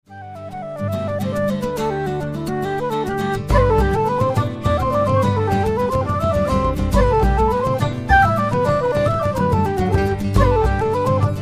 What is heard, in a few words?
bodhran whistle flute sessions; carnadoe